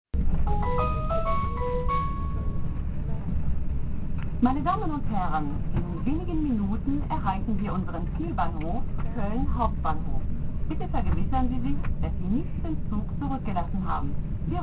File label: thalys